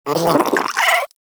Vocal Idle, Hunting Critters; Nexifera

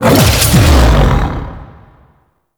Howl Cast; Warframe; Voruna

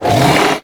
Rock Punch Cast; Warframe; Warframes; Brawler

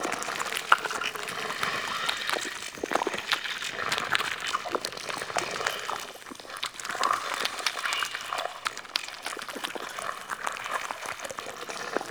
Eyeball Jiggle Loop; Ambience; Props; Warframe; Entrati

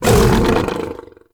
Cast Impact Vocal; Warframe; Uriel; Infernalis

